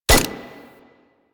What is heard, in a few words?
Fire Mech, Weapons; Latron Prime